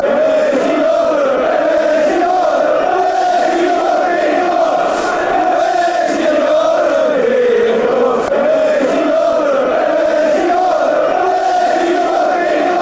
wheres your european cups, fan chants

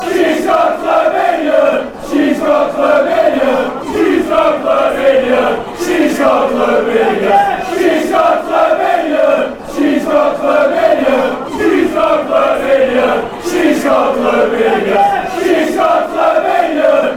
shes got chlamydia fanchants

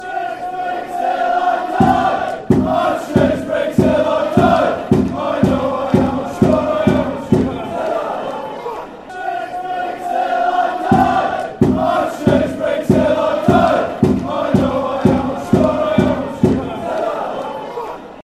shrewsbury till i die fanchants

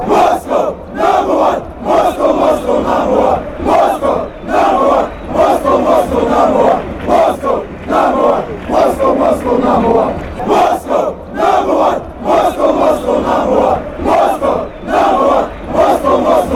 moscow number 1 fanchants

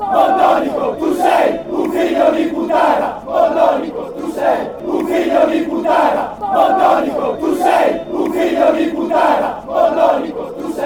chants; botonico

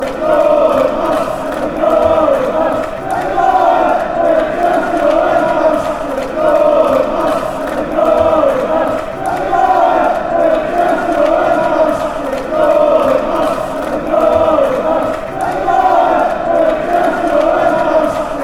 yer going bust fanchants

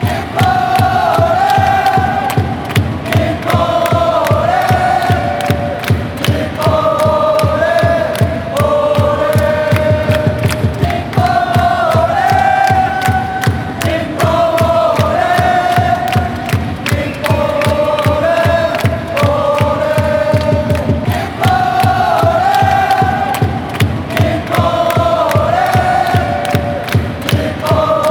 nippon ole fanchants

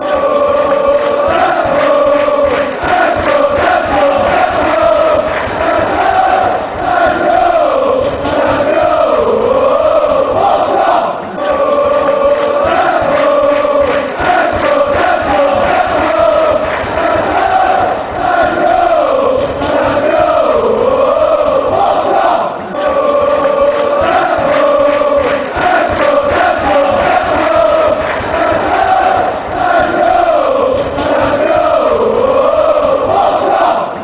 time go fanchants

